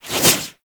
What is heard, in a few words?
Warfan Whoosh; Warframe; Melee